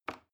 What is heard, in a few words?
plastic button small release regular